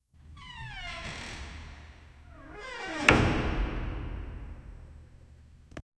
slight echo effect for la, large room

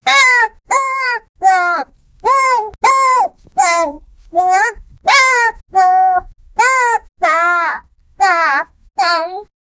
monkey sad

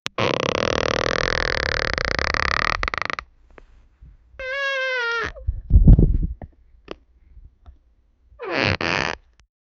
bed creaking during, bedroom

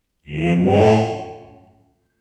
create an epic sound effe, epic

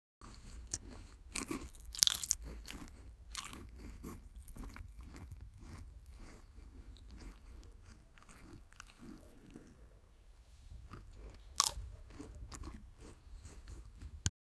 sound of apple biting

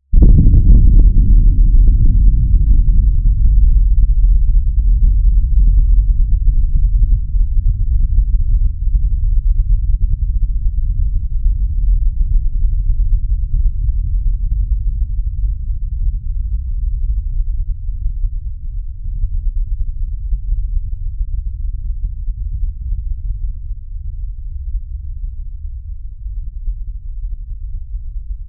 a deep low frequency volcano

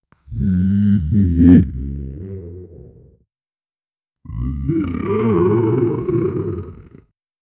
aquatic animal reptile am

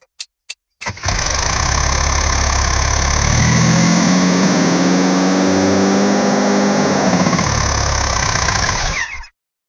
start up of scania 770, truck

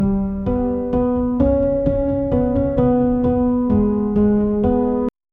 piano

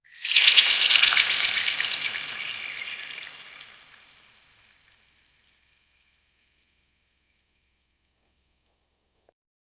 a deep amplified sound of, powder

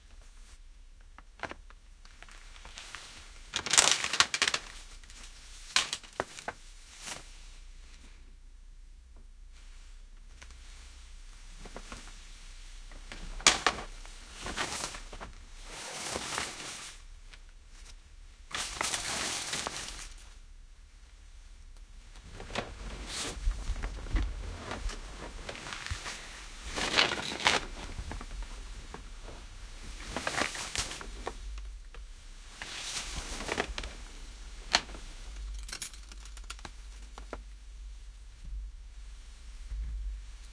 beds almost breaking, creaking

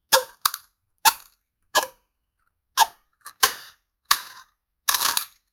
camera

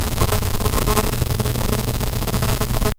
a plant cell being born

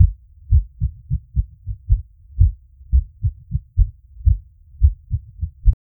scared heartbeat fading; emotion

